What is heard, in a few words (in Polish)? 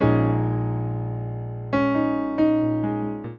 pianino